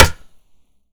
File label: Hammer Nails; zps